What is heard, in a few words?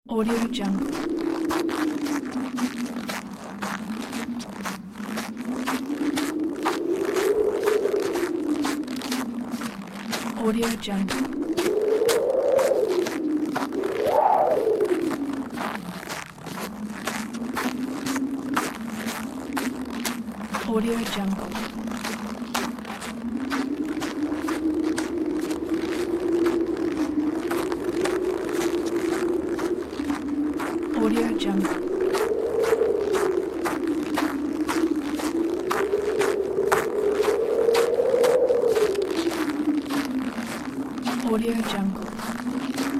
walking in snowstorm; soundproductionfx